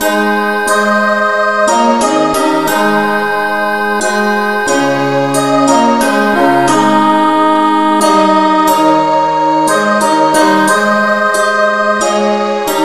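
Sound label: no vocal, key A flat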